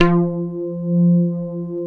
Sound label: SYN ORG SY, Roland; Sound & Vision - Gigapack I CD 2